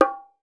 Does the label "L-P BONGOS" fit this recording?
Yes